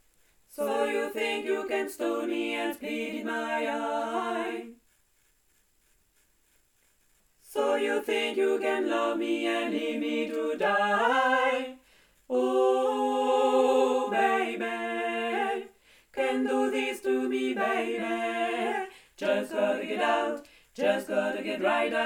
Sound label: tutti, chorale